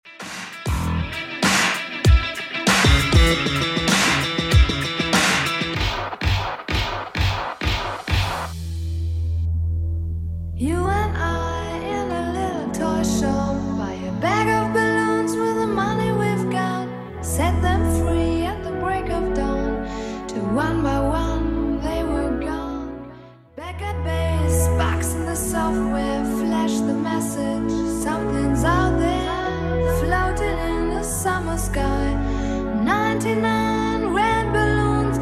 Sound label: 80s Redrum 97bpm